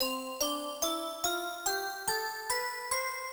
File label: Sound Scale Check